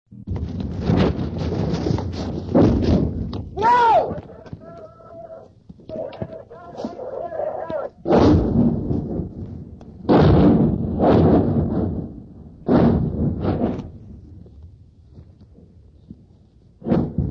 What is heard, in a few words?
police rocket attack